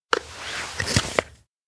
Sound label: mask remove; anemoi